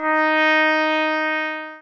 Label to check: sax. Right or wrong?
Right